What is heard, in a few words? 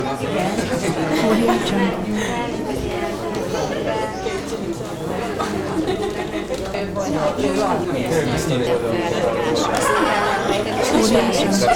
indoor crowd ambience loop; debsound